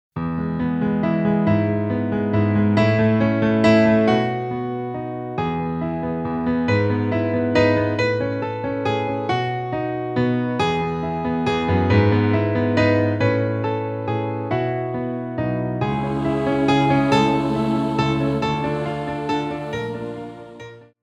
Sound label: Fondu, Ballet